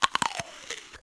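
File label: eat crunch; Thief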